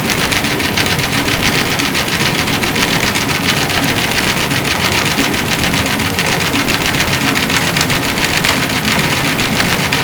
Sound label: Hydrophones, orcasound